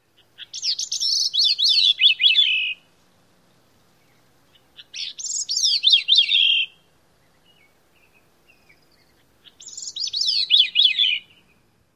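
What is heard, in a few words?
song, gray-cheeked thrush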